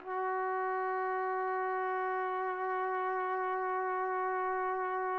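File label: TPT, trumpet; Roland - LA Composer vol. 3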